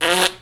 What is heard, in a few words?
Raspberry; Vintage Cartoon; Comedy Vocals